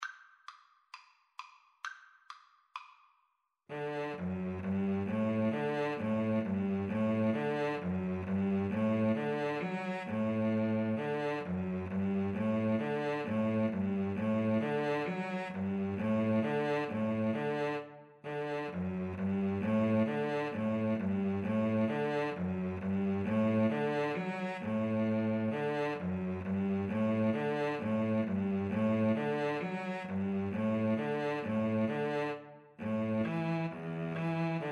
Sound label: fishers hornpipe Viola Cello; Viola-Cello Duet